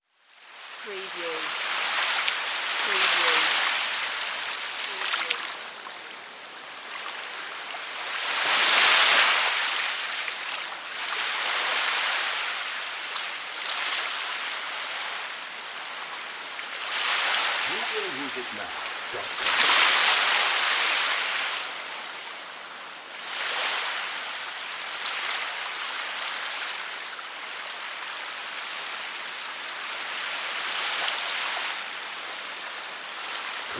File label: Rarotonga Beach